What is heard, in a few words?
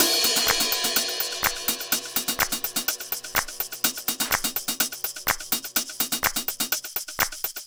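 percussion, Drum Loops 125bpm, Nu Dance Electro; Garage Band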